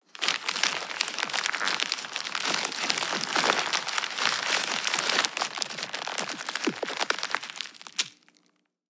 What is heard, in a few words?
Foley - Paper; Loops; Ghosthack - Color Bass Essentials